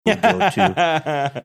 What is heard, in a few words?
funny laugh